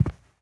Footsteps Sneaker Carpet Walk; Infernal Radiation

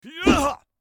Character Black Attack; angry birds epic android ios mobile 2014

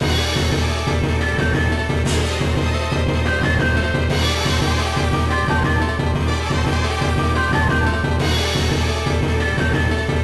Boss Approaching; Alien vs Predator; arcade

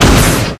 attack; guns n zombies 2014 pc

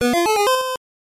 Coin

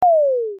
Enemy drop

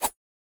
Throw; Boomerang Fu

